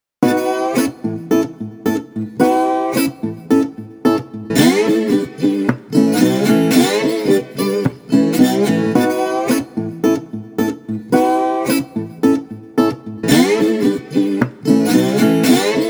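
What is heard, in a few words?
Street Resonator Guitar Gratified, 110 bpm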